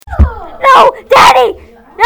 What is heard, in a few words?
groans; super mario